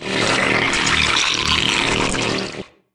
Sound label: hatchery, zerg buildings; Factorio Paranoidal mod; erm zerg hd assets